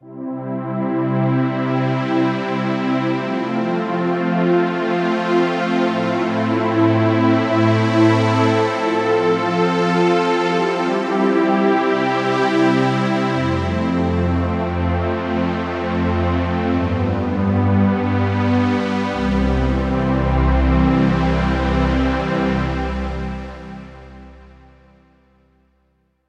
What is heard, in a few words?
Pad The 80s; oasys assault